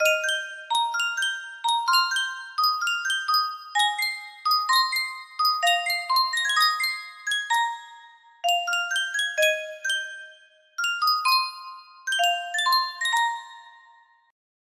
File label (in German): sankyo spieluhr